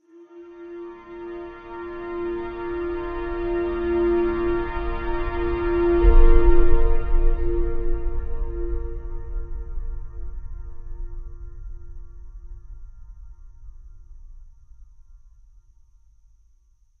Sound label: Ambient Trailer Whoosh; Whoosh Sound Effects